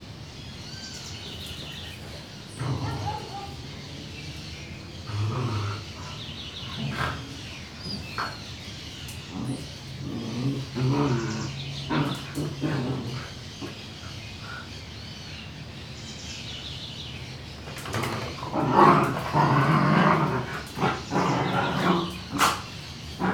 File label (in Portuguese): Cachorro rosnando, bufando e correndo dentro de casa com passaros longe; animais